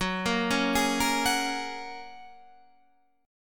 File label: Gb Major Add 9th, down, Guitar, Standard; arpeggiated; JGuitar